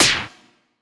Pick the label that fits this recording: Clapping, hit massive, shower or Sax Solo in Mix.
hit massive